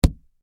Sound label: Impact Punch Body Hard Solid; Punch And Hit Sound Effects; Punches and Hits (Sound Effects:Combat); SFX Bible 6